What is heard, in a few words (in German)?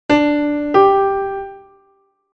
Intervalle sukzessiv